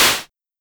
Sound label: Simmons Clap